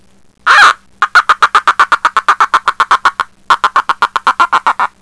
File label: rooster cackle